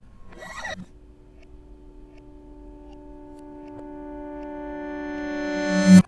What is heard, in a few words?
Back Acoustic, Guitar